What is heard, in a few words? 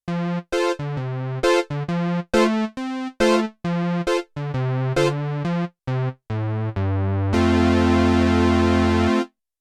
Single Basic Prophet, Yamaha TX16W, Prophet 5